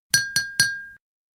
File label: Clinking, cup; Glass cup and Saucer; صدای استکان، فنجان و نعلبکی